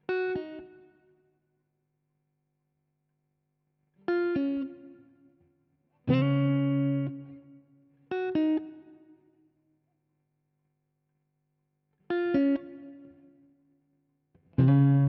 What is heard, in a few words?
Guitar Loop 120BPM C#m, chords & melodies; 99Sounds; Monosounds; Music Loops; Instrument Loops; Guitars